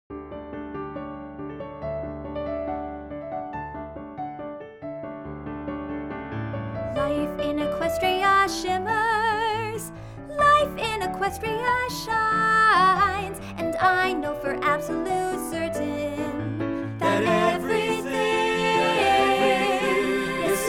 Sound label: Piano; My Little Pony Friendship is Magic; Season 3